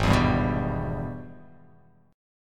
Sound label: G sharp Minor Major 7th Sharp 5th G sharp down